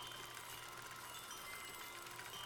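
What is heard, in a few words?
free Spin Reel Spin Sound; netent; sweetyhoneyfruity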